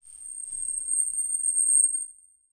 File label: Title Transition Crystal Eerie; Production Element Title Transition